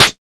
Rich Top End Snare A Key, snare sound tuned to the A note